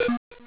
groupchat server message; tkabber; psi

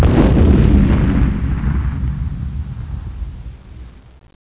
hit; netpanzer

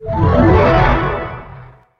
heal; planeshift

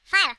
fire; wormux

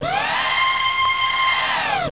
detection; glaxium

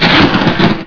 shield Destroyed; blobAndConquer; combat

